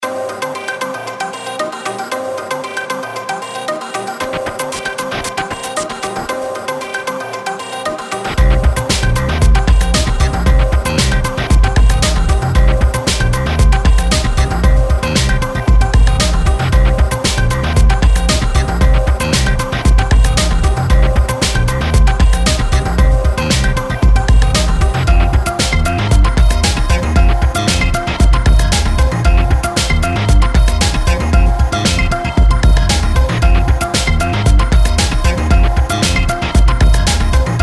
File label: Battle; Azur Ring Virgin and Slaves Phylacteries; FireGoblinKing